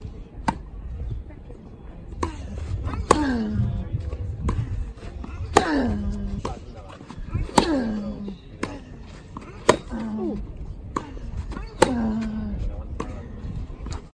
Tennis court ambience hard court

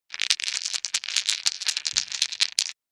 Casino game dice shaking in two hands

